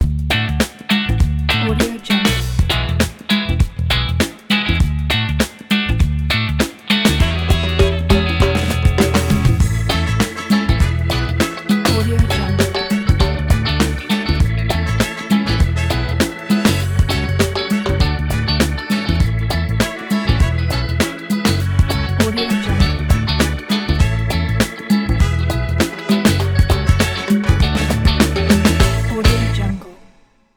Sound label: tropical reggae is fun ident